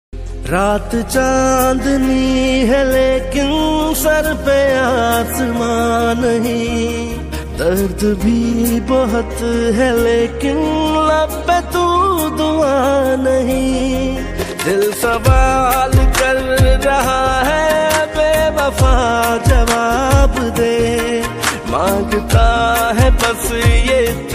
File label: Sad Song Ringtone